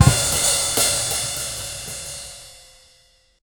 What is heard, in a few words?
fun and springy outro, upbeat